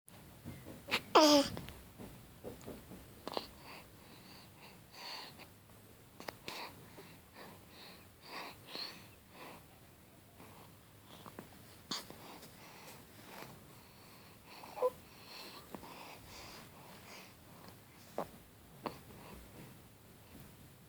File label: Vocal